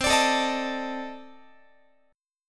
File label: C Minor 6th Add 9th C Guitar; Cm6add9 strummed